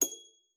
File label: HFEM G7, HARP; Xsample VOL-8; HARP MF